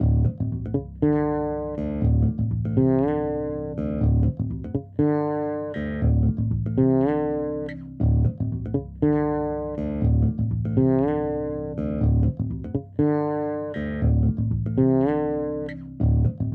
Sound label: Bass; raji an ancient epic